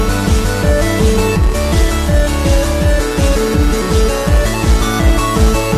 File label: ringtone